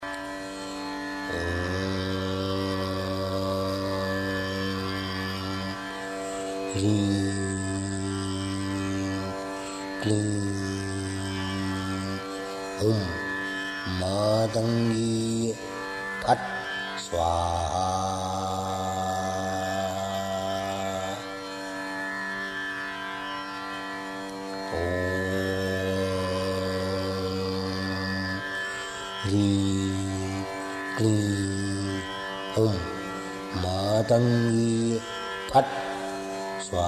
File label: matangi mantra; av yoga; mantry